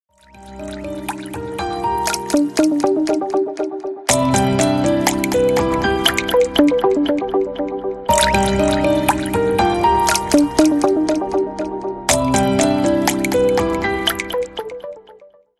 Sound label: Water Lenovo Z5 Ringtone